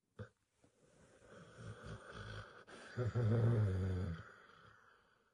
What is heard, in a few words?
long sigh; audio meme